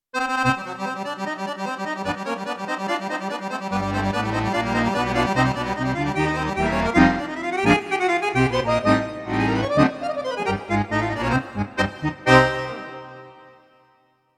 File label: Acoustic Accordion